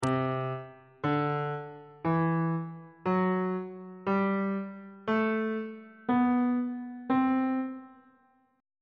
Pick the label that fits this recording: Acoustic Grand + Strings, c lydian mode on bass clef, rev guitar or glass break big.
c lydian mode on bass clef